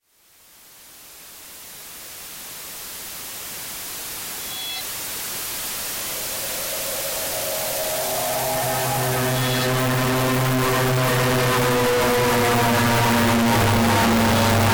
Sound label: noise